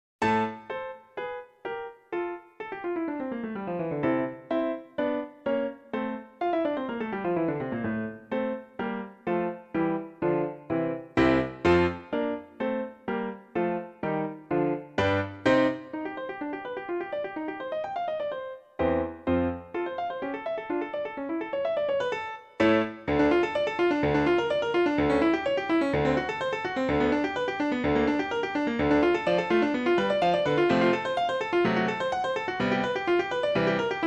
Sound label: oboe sonata; saint-saens